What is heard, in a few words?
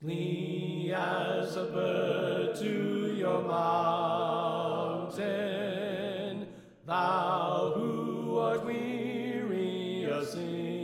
Tenor Left